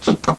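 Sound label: PTattersalli, sounds of the respective species; Lemurs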